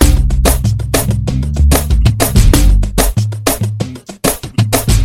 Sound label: BEAT